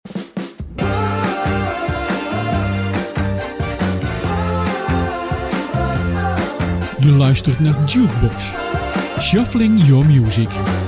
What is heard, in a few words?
jingle; Jukebox